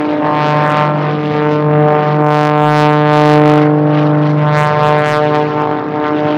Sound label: propeller strain, cessna; gmod; lfs luna s flight school